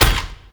Necramech Melee Step; Enemies; Warframe

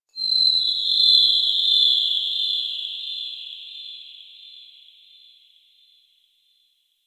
Forest Distant Bird; Ambience; Warframe; Grineer Forest

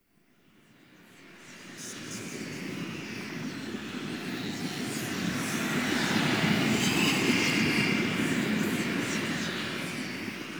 Reliquary Amb One; Warframe; Rail Jack

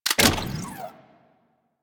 Shotgun Reload; Crp Shotgun

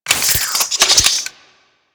Sidearm Equip; Weapons; Warframe; Tenno; Wraith Sidearm

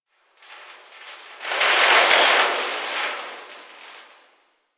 Gas City Rattle Distant Metal; Ambience; Warframe; Corpus Gas City

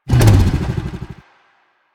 Engine Rev Stop, Motorcycle; Motorcycle Ninety Nine

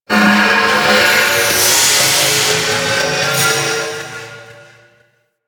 Multi Steam Jet Machine, Ambience; Warframe; Corpus Railjack

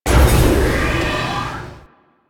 Tram Start; Warframe; Ambience; Grineer; Grn Tram Connector Curved

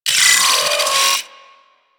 Arachnoid Micro Vocal Death; Warframe; Corpus

